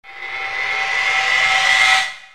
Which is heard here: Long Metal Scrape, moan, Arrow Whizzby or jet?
jet